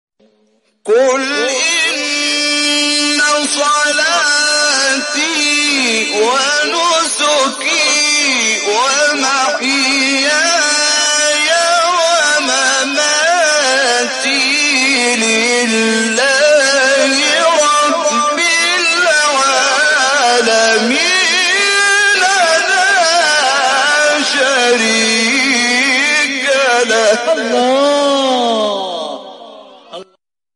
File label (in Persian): تلاوت آیه سوره انعام; آیه 162